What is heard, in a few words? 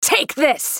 spell cast effort, en US; Sivir